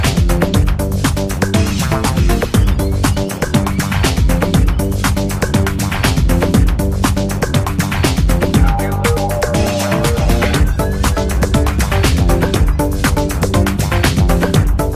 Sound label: Ringer Jingle